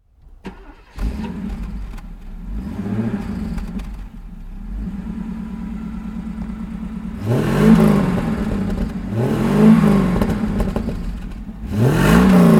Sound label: Mercedes-Benz 450 SEL 1976